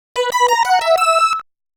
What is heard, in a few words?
Stage Round Start; Darius; Darius 30th Anniversary Box